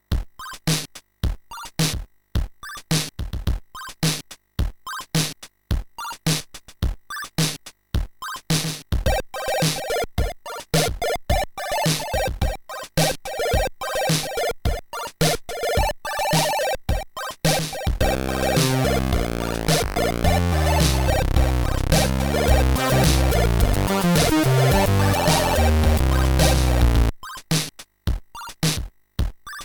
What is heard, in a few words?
song